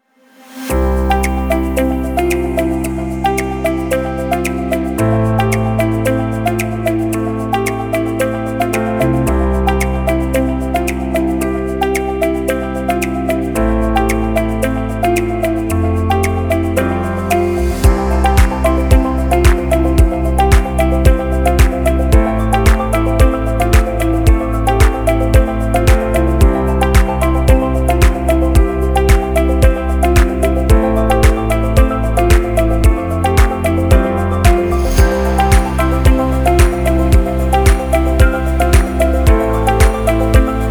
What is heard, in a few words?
Ambient Waves of Inspiration - ambient, background, corporate